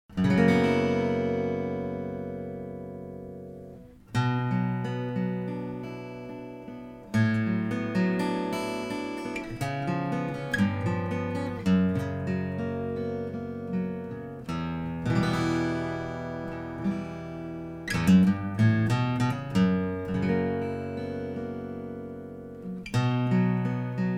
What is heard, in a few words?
parlor double top; Don Latarski